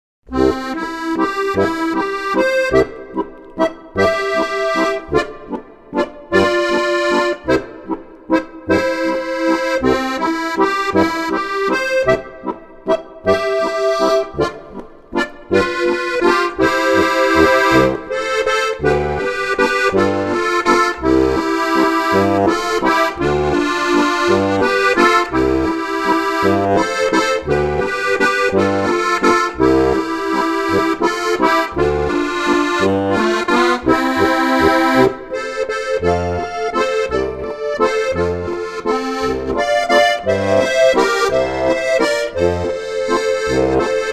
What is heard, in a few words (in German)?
harmonika